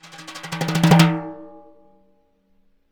TIMB BR HI, Synclavier Percussion; Synclavier Percussion 2; World & Orchestral; ILIO

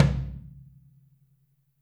FLR TOM OH; Q Up Arts; Denny Jaeger Private Collection

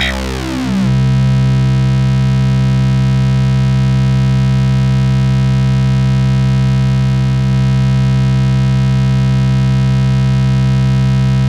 C2, SynthLine; 90 s Sample CDs; NiceBeats; SynthLine VOL-33

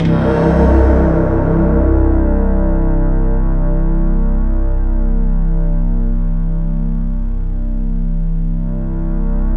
C2, SynthLine; 90 s Sample CDs; NiceBeats; SynthLine VOL-22

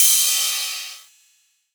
Rides, Cymbals

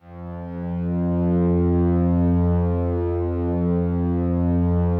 slow strings F1, Yamaha VP-1; 90 s Sample CDs; Music Store

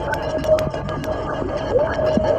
Water Scrape; Sonic Foundry (Sony Creative Software); Ma Ja Le Ethereal Textures

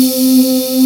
Voice; Best Service Gigapack 1

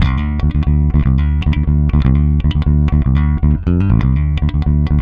throb D, 120, bass; Spectrasonics; Bass Legends

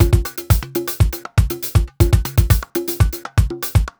120 basic, Nu Jazz House Beats; PowerFX

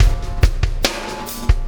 Wormhole Beat, Processed Drumkits; Sonic Foundry (Sony Creative Software); Zero Gravity Beats

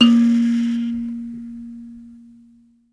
BUZZ, Buzz Kalimba; Roland LCDP11 Africa VOL-1

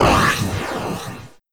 Metal; Sonic Foundry (Sony Creative Software); Esoterik Beatz; Mecan FX

